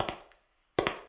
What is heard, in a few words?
Steps Marble